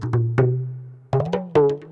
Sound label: PERC LOOP ETHNO 125